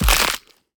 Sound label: Otter bite